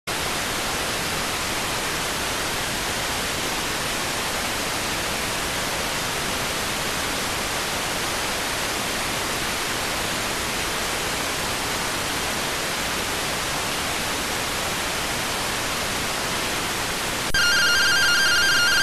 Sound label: rtty rx